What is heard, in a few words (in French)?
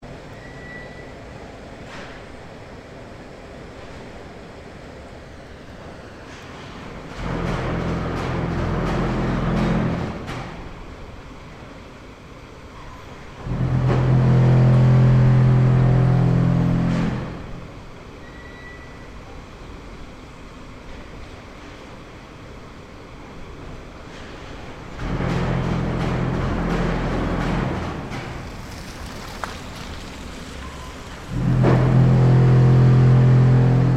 usine de ciment; flemalle; 2009 deambulations